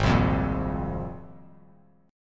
G sharp 6th Add 9th G sharp; strummed; JGuitar

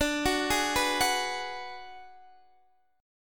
D 6th Flat 5th, Guitar Open D

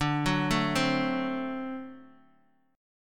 D 7th D Guitar DADGAD

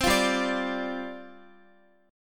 G 7th Suspended 4th G, Guitar; G7sus4 strummed; x,x,10,10,7,9

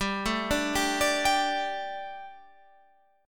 A 7th Suspended 4th G, chord

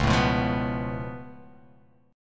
Db Augmented 7th Db down; strummed; JGuitar

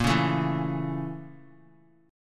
A sharp Minor Major 7th Flat 5th, down, Guitar; strummed; 4F,4A,5C,5G,5C,6E; JGuitar

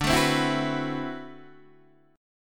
D 13th D, down, Guitar, DADGAD

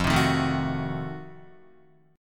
F 7th Flat 9th F down Guitar; F7b9 strummed

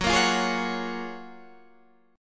Gb Major 9th, down, Guitar, Standard; strummed; JGuitar

